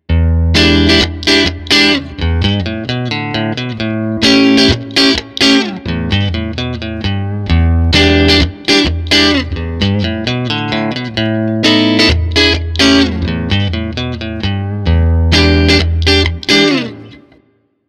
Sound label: Bridgepickup; Fame Telli